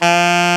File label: SAX A. FF F, Alto Short; Roland LCDP07 Super Sax